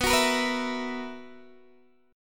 B Major 7th Suspended 4th B down Guitar Open D

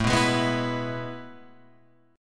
A Major 7th Suspended 2nd, Guitar, Open F; AM7sus2 strummed; 9,6,8,6,7,6; JGuitar

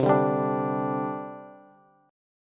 C Suspended 2nd, C, down, Bass; Csus2 strummed; Csus2 chord

